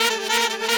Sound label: Techno Effect Sect, Effected Horn Section; Sonic Foundry (Sony Creative Software); Jazz Solos and Sections